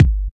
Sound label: original bass drum sound g key